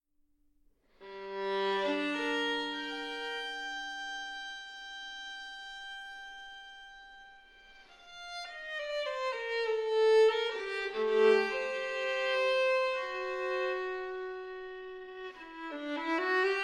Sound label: violin sonata in g minor adagio; violin sonata no. 1; bwv 1001 i